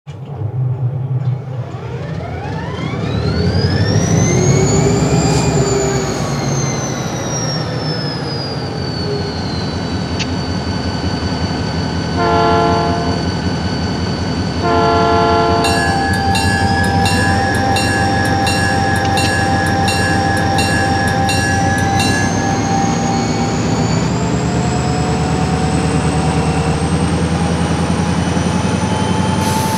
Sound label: Diesel Sound; Diesel Sound Demo; RailPro